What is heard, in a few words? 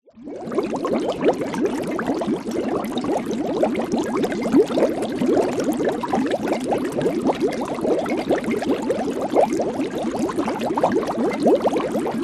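horror cauldron bubbling inside perspective; zapsplat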